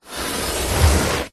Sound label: foley wood pole end short scrape drag on carpet; zapsplat